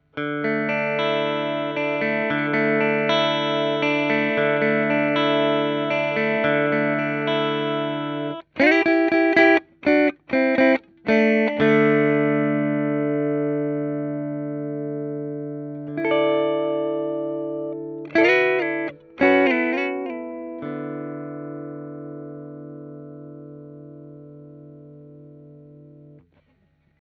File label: clean, amps; mamboo; booteek amps handmade by marshall heiser